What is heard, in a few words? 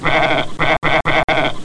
Lamb; Education Publishing Concepts